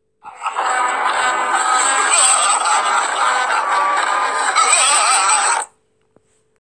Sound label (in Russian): smekh; mellstroy